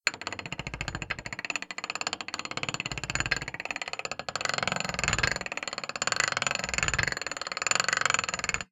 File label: inner cogwheel turning long upwards screeching, Metal Gears Cast Iron; Rode NTG2; Sound Design Tiedemann